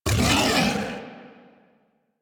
Feral Vocal Angry Attack Short; Warframe; Kubrow

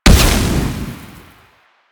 Proj Impact, Weapons; Duviri; Incarnon Adapters; Incarnon Dera Vandal

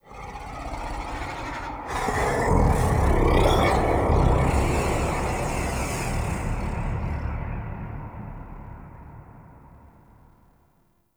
Sky Breaths, Ambience; Warframe; Duviri

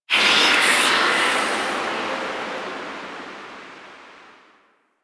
Tailor Shop Door Whispers; Warframe; NineteenNinetyNine

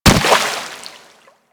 Infested Runner Step Water; Warframe

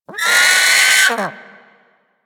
Sea Bird Vocal Alerted; Warframe; Hunting Critters; Ostron Sea Bird

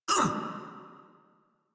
Pain, Battle; en; Warframe; Grineer; Catbrow Handler

